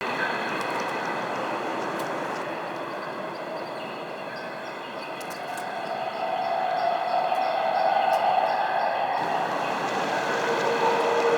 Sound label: Day Amb Loop, Ambience, Glades; Glades Of Andurin